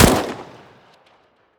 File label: famas, weapons; Counter Strike Model Pack; CS2